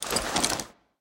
chariot bridle; smite; Apollo

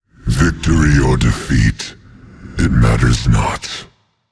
"yay"; smite; voicepack; set

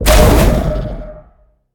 recall emerge; Smite; Cerberus

